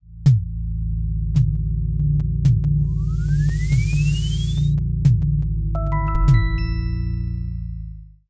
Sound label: premium cinematic intro